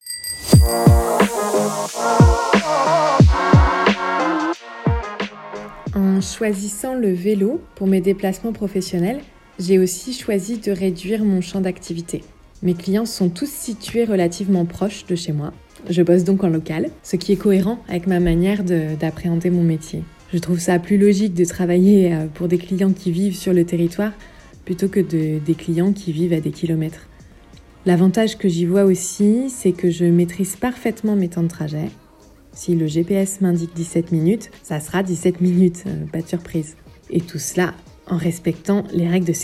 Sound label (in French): jingle